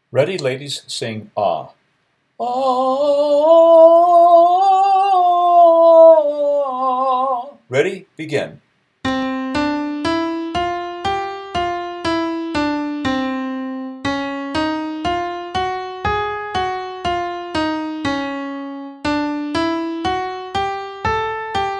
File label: 5 Tone Ah for Women with Instructions